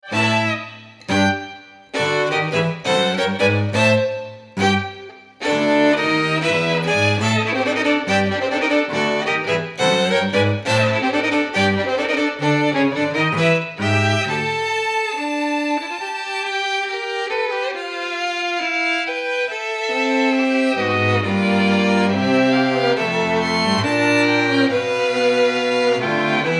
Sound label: Strings